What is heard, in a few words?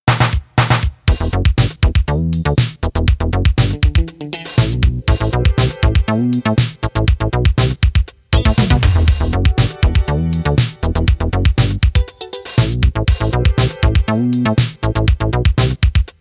funk synth